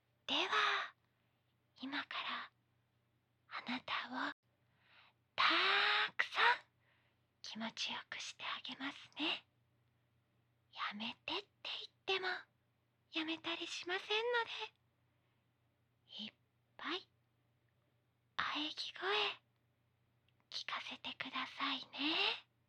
貴方を誘う耳舐め, ASMR

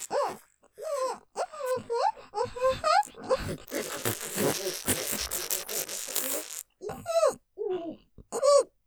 （咥えたままで）フェラ音マシマシ; おかずにスパイス; 後乗せエロエロ; 射精いいよ♪

